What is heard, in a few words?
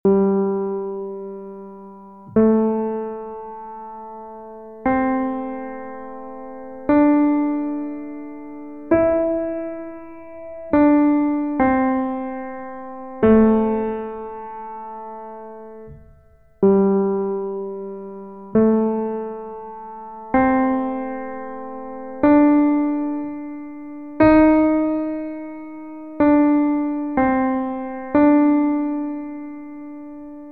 Penguin Blues solo